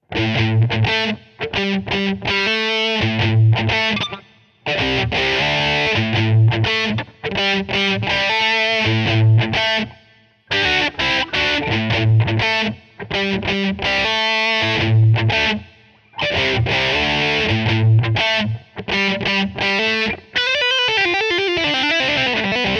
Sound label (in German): Rock Rhythm Steg HB